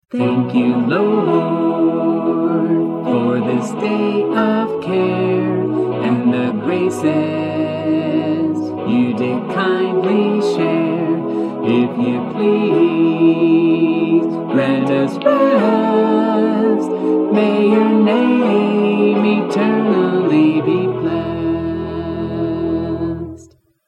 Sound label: Lullaby vocal